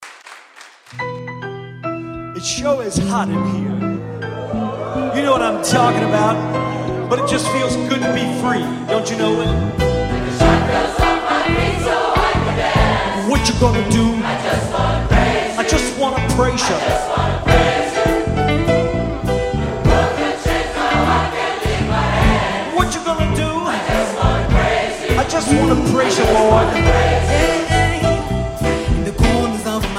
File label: Gospel Choir; Rueil Malmaison; FRANCE